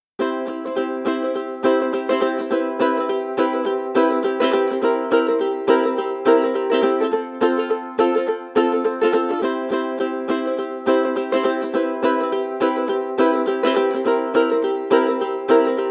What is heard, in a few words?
Hawaiian Ukulele Guitar Happy 104